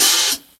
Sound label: hot open hat one shot g key, Royality free open hi hat sample tuned to the G note